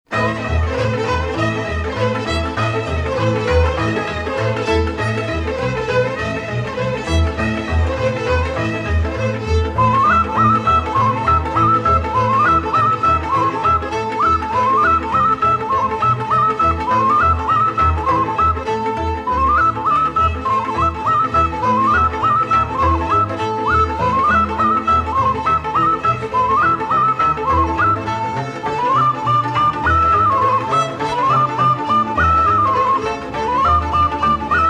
nai, folk orchestra, sarba, rumanian folk music

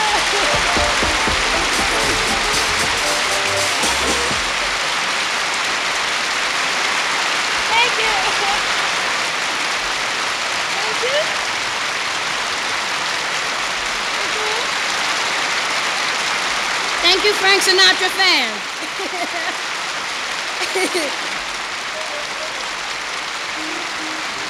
fanfare and announcement

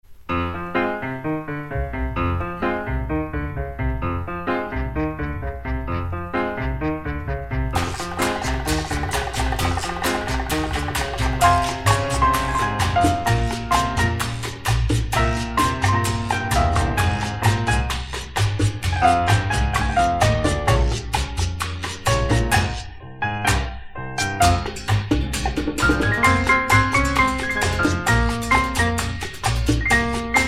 piano and orchestra

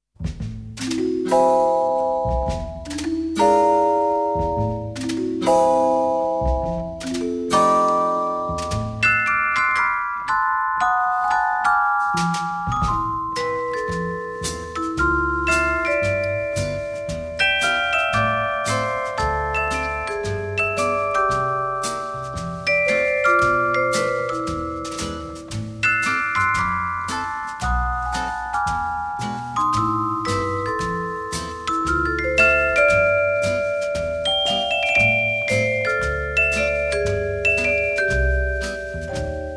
piano and orchestra

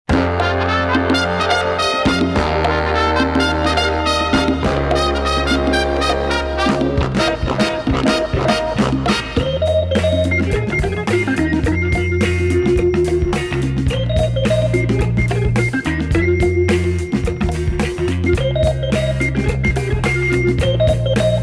wurlitzer; Radio Vorarlberg; Kennmelodien; Dave Allen at Large

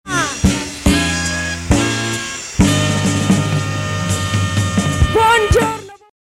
fanfaryi; труба; тенор-саксофон; Оркестр Росгосцирка. Рим, 1997

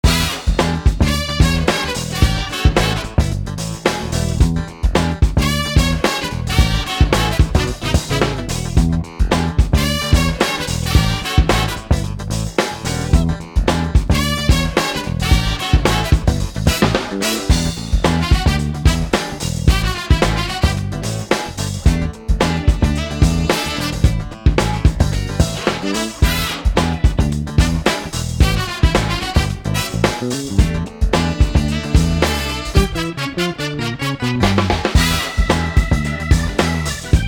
funky noises; Radio Vorarlberg; Kennmelodien

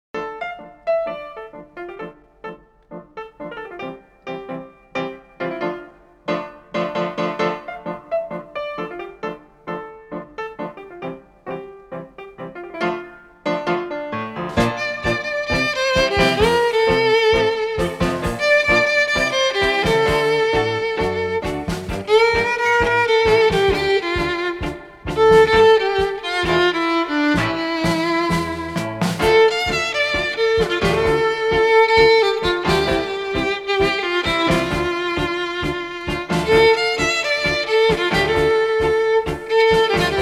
tango; no k/f teātris

